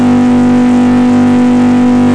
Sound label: engine old, cars; vdrift